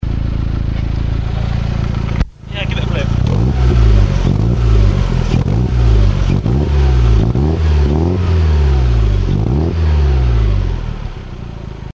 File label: idle and blip, exhaust